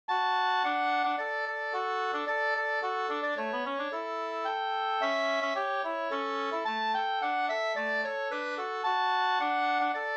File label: polka; Stumpen av Allan Tornqvist Vg utl av Rebecka Westin 2st